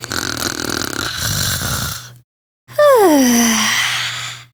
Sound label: Snores, Daughter, Vocalizations; Warframe; Deimos